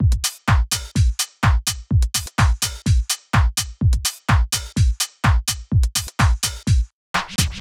Drum Loop Full 126bpm

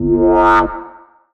Synth Shot F

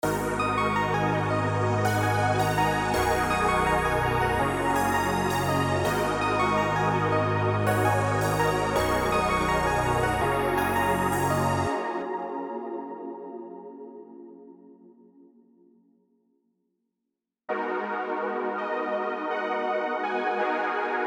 165bpm

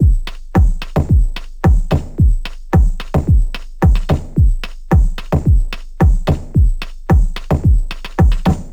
110 drum loop

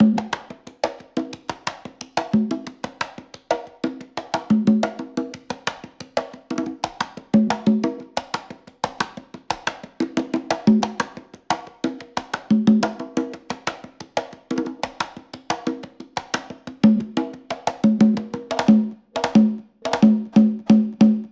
90 conga loop

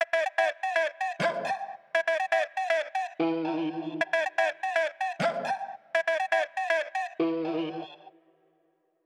Vocal Chops Wet F-min 120bpm

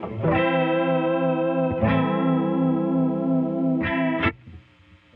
chords, A min

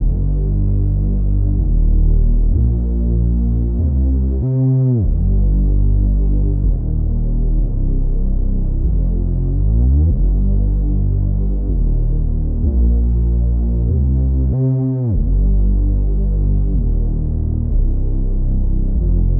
Bass G m 95 BPM

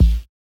Tom Floor Modulated Hit

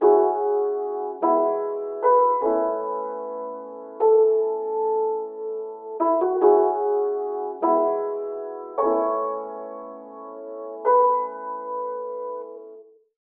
75BPM Emaj; Passage Sunny Afternoon; Lo Fi

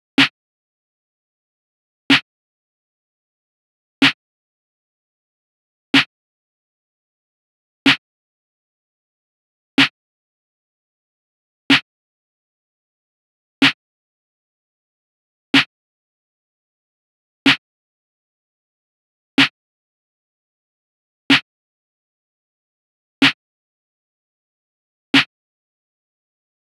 Rises BPM125 Snare

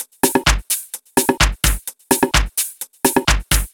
Drum Loop 128

